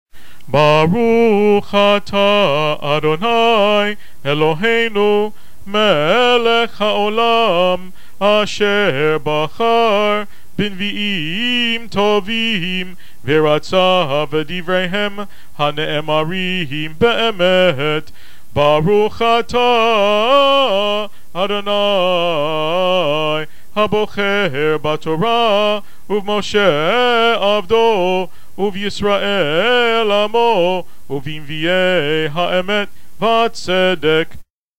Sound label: haftarah before